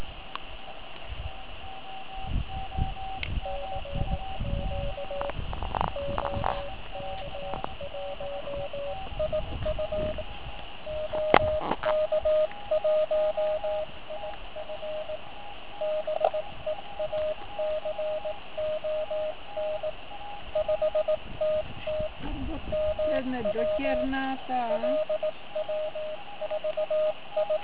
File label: Beacon 500mW